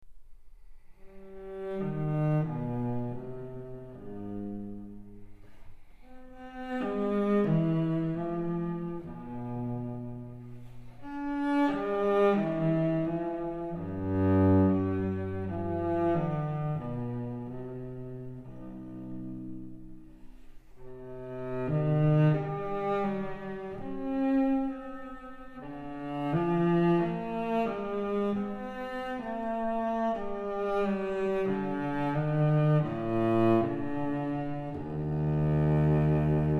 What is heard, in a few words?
Cello